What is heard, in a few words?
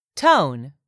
tone